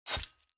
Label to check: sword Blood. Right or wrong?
Right